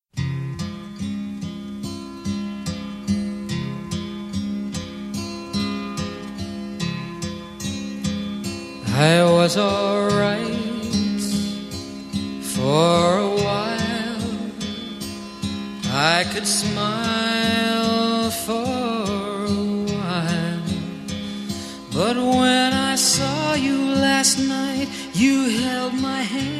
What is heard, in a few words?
crying